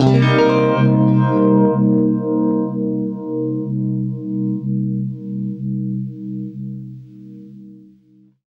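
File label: univibe Cm7 right, guitar; East West; Steve Stevens Guitar Samples Collection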